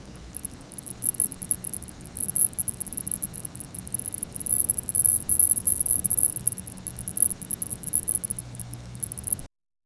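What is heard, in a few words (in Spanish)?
empiezan con un roce sutil, stone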